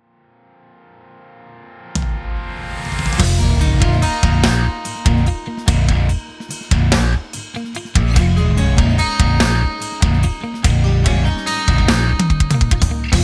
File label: shred backing track